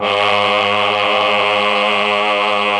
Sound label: CHOR, Propeller Island Legendary M400; ILIO; CHOR+STRING1